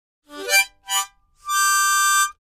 Mouth-organ, Short Final Chord, Type 3 - Ascending; woodwinds harmonica